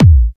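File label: Cool bass drum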